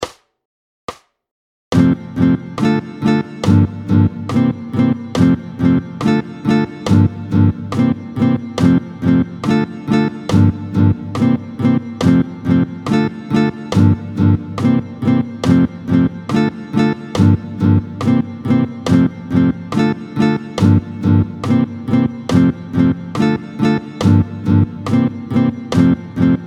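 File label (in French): Vite 2 2 T140, L'anatole, Vite, 2/2, tempo 140, guitare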